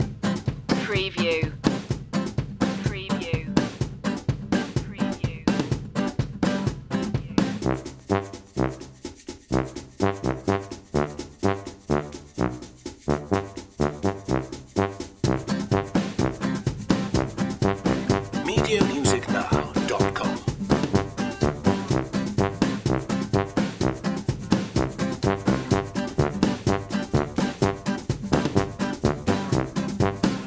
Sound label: cajun gumbo loop